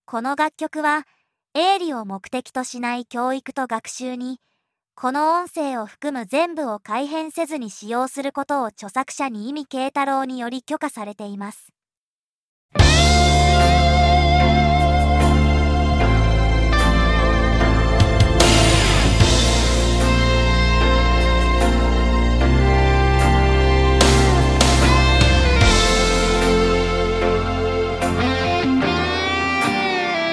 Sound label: Instrumental